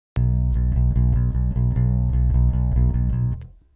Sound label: bass